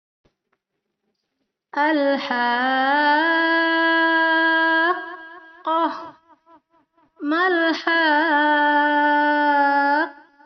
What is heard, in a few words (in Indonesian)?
Perekaman baru Karaoke denoised